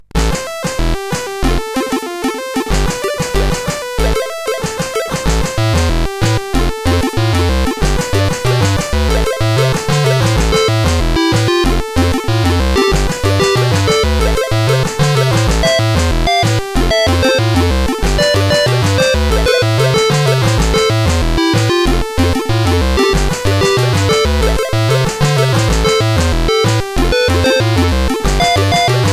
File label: remix CSG8580R5